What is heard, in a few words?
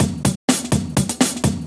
drum loops; bb drum loops